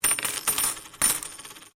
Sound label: Coin Penny Multiple Drop Wood; Common coins; Money; Objects